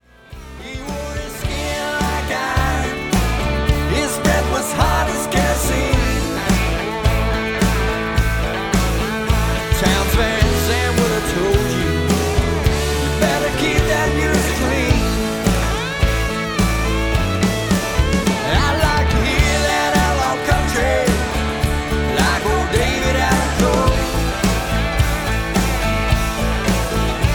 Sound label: Southern Tune